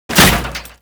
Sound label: Restrained Foley, Worker; Warframe; Characters; Solaris